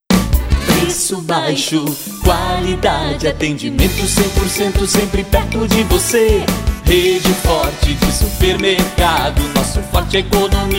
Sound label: jingle